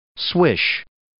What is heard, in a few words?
swish